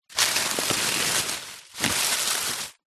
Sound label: foley dried leaves movement crunch